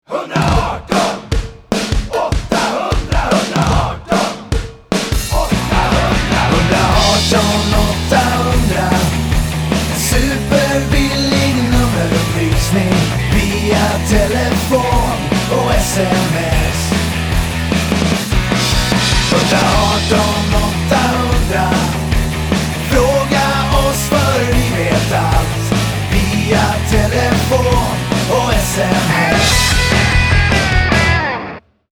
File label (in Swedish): Jingel